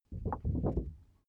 paper wobble long

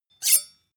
sword blade grind short

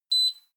oven on

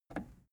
plastic button scratch push disable

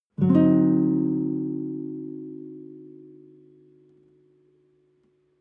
nylon guitar chord full diminished soft

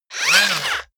robot movement fast short

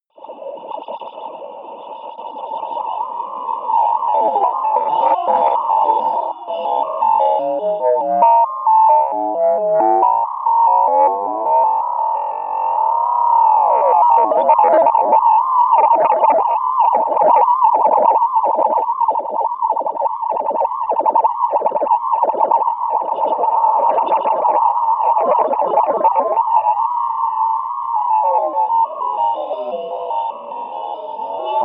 atmosphere up in space

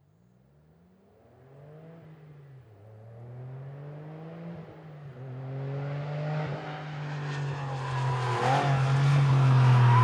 porsche drive round a bend

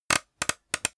cd case creak

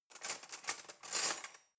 cutlery knife drawer rattle

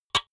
typewriter single

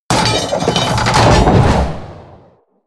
catapult siege trebuchet medium shot

